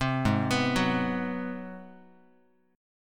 G sharp 7th Sharp 9th, Guitar; G#7#9; arpeggiated; 3,4,2,4,x,x; JGuitar

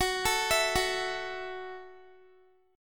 Gb Minor 7th, Guitar; Gbm7; arpeggiated; x,21,21,21,18,x

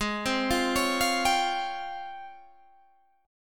C sharp Major Sharp 11th G sharp

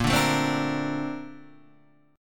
Bb Major 9th, Bb, down, Guitar, DADGAD

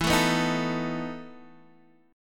B 7th E; B7/E strummed; JGuitar

